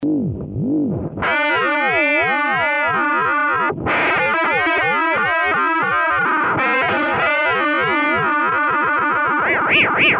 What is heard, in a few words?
Octi synth